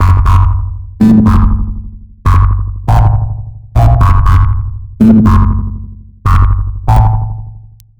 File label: Grumm, Baysline; Sonic Foundry (Sony Creative Software) - Future Retro Dance Excursions